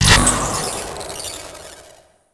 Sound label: Sentient Scan Burst; Warframe; DE; Sentient Scanning